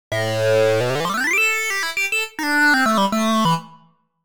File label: Prophet classic sync; opx pro3